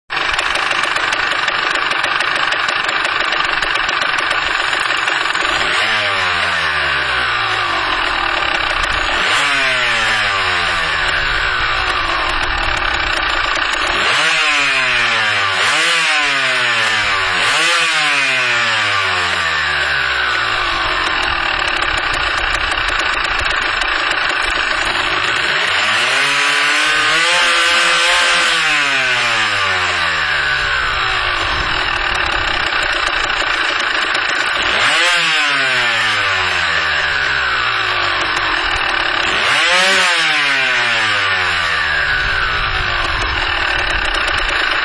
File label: engine